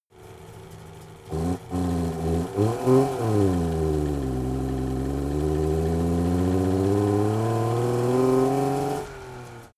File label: car saab 92 accelerate single gear engine